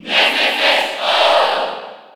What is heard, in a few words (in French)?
Cheer French; Ness; SSB4